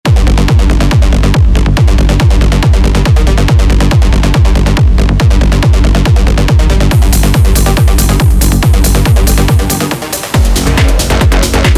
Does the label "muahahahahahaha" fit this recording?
No